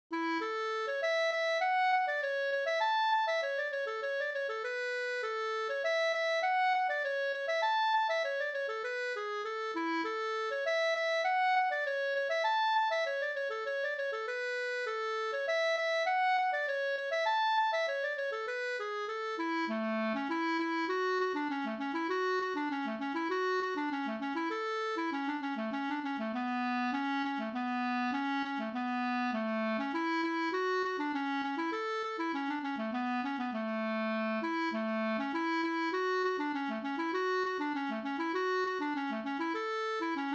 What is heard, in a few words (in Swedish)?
ganglat snoa; Vackra norskan